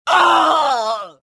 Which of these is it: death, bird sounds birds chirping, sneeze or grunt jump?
death